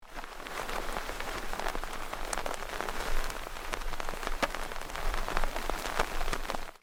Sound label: rain on tent